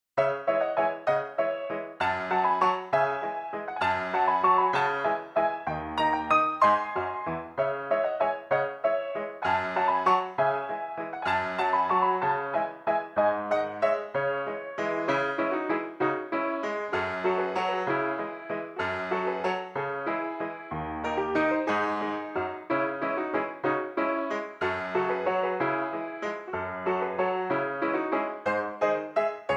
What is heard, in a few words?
Waltz, Classical